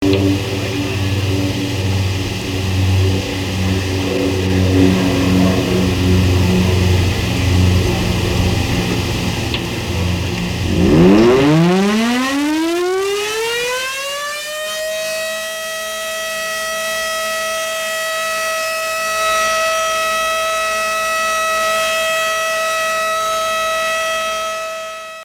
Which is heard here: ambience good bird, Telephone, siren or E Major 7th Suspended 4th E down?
siren